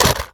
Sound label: weapon rifle impact; physics; weapons